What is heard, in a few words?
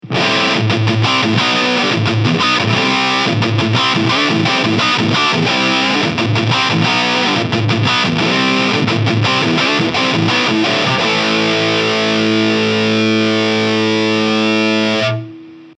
Hard High Gain Low Mid Boost; JHS Pedals